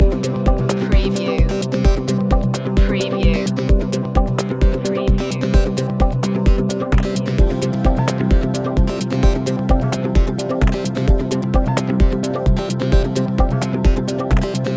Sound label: loop, chill